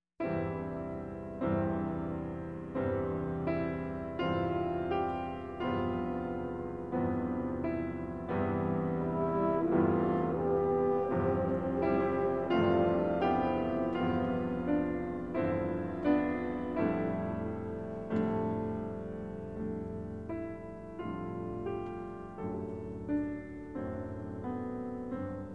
Largo; concertos

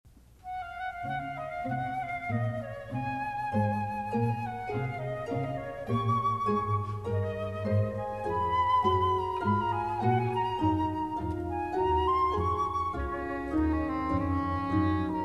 Chorale variations; musique religieuse

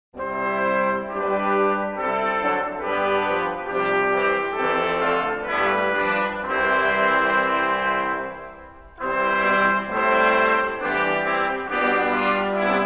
Chorale variations choral; musique religieuse

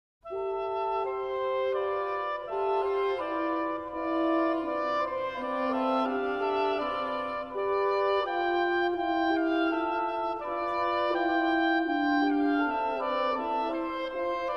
Instrumental Miniatures Andantino

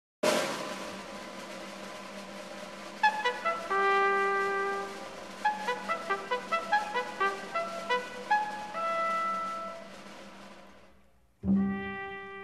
Preludium For Jazz; musique chambre

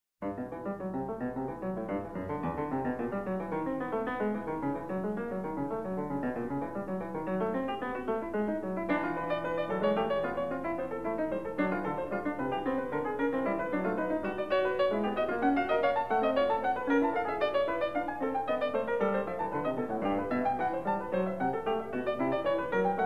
Piano Sonata; Piano Sonatas

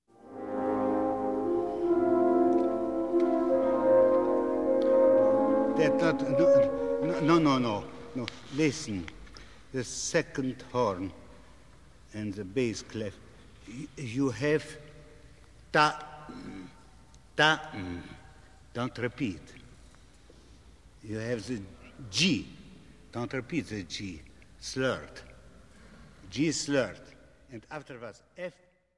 Piano Concerto; With Philippe Entremont; repetitions

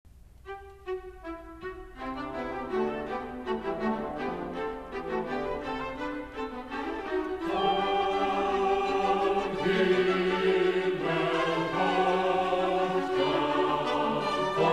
Chorale variations; musique religieuse

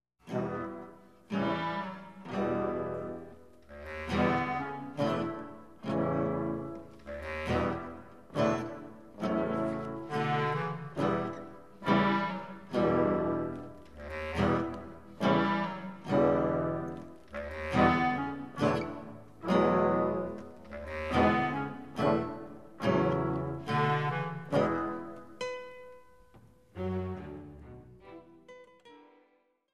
Tango; musique chambre

